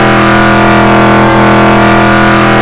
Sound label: shelby on mid